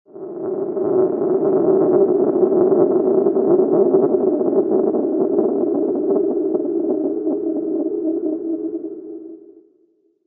Roll

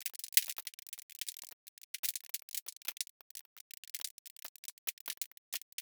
fire cracklings

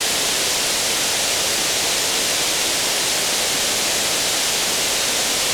rain noise

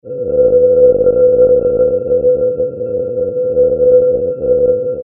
Roll

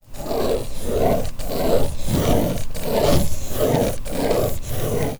recorded Arches

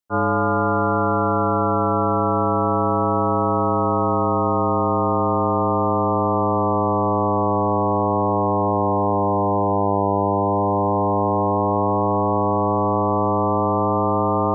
Inharmonicity

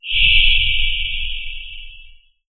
Pass By

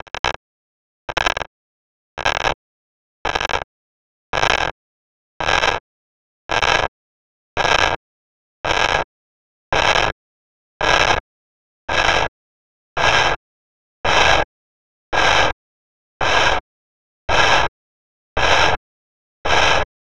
Rubbing Scratching Continuum Synth

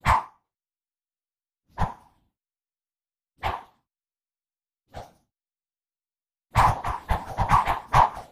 swishing stick

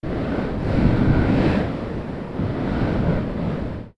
wind synth

